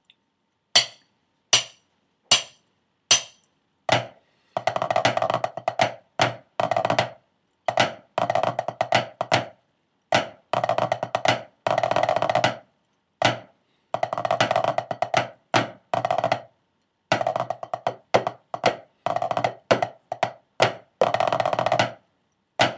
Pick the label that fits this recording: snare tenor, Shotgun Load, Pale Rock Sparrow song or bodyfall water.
snare tenor